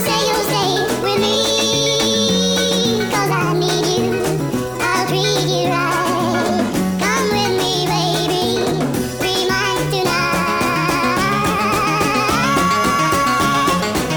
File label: pitch